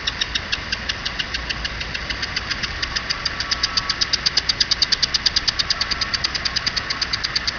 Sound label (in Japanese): sesujituyumusi; zukan